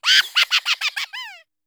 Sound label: greevil laugh